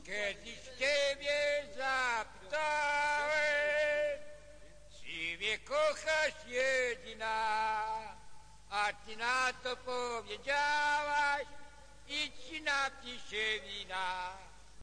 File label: ciebie zapyta drunken singing, MALE SINGING